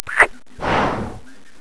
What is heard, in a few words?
blow kiss